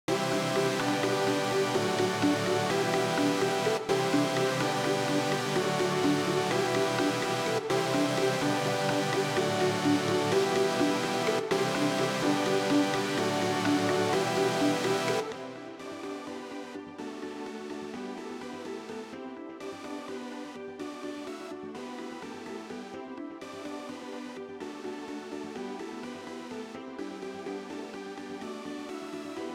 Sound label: synth stem